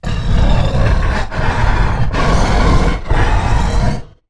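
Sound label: Stealth Breath Movement; Evolve; Goliath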